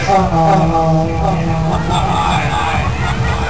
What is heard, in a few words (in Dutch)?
Ohoh, hahaha; Kermis Kassa Diversen